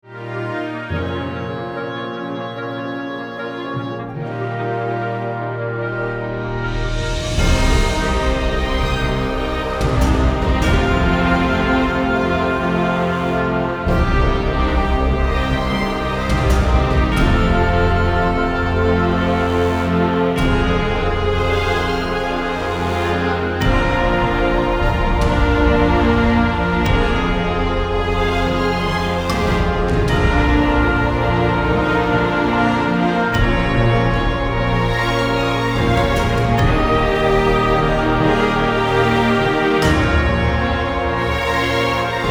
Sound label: Adventure MIDI Orchestration Excerpt